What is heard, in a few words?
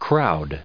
crowd